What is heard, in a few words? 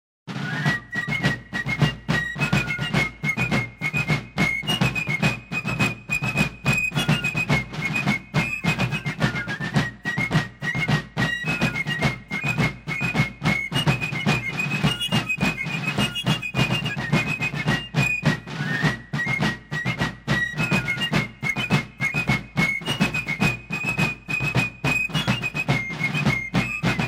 Fife & Drum; Gettysburg